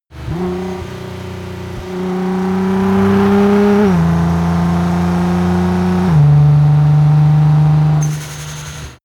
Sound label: car nissan gt-r 35 accelerate rev up